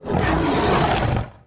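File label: orcs voice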